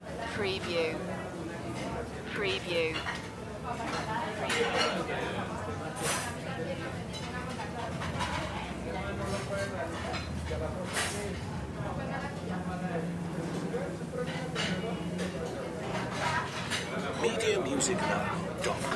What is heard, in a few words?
restaurant bogota